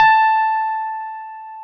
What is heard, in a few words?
CP-70 A4; E-MU Formula 4000 Series Vol. 3; Analog Odyssey; VK Instruments